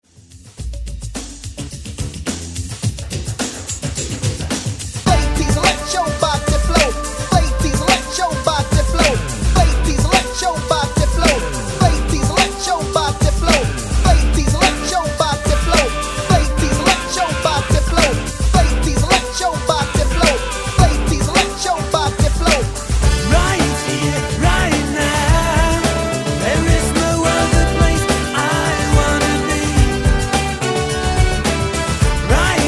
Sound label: Rock Hype Re Drum 95Bpm; DJDOLLS